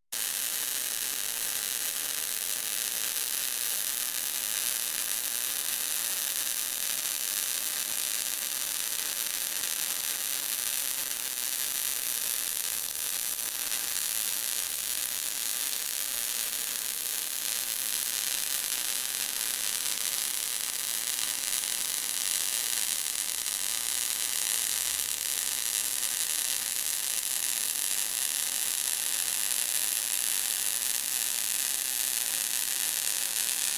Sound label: Electric Sparks, Scary Sounds; 1001 Sound Effects; Halloween Horror Sounds Effects